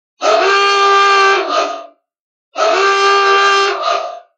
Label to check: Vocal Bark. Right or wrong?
Wrong